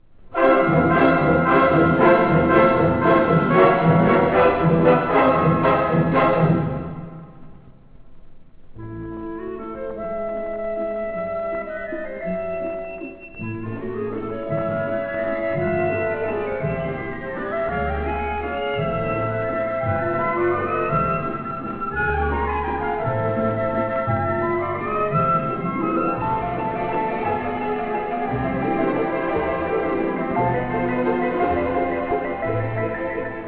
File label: koncert vals